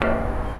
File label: Third Ward Emancipation Park Garbage Can, percussion; Houston